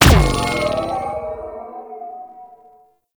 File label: Overguard Pulse Repeat; Warframe; Infested; Ancient; Protector Ancient